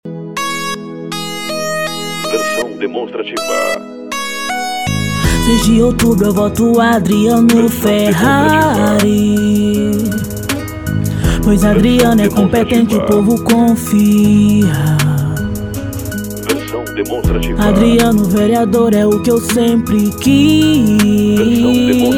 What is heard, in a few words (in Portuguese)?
jingles politicos